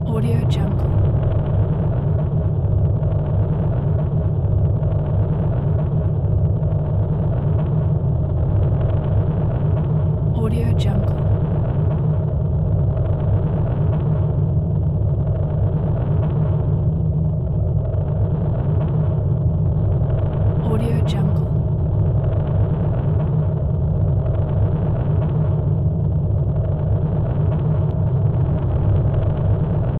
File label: alien spaceship ambience; xylote